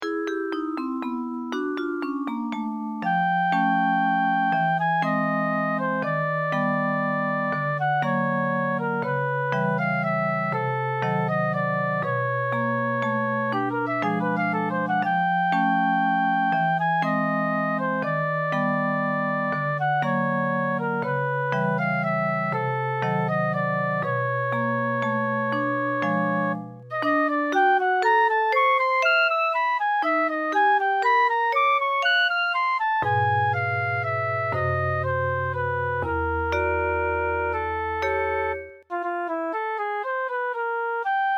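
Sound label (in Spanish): danzas antiguas mazurka flute & guitar; 3 danzas antiguas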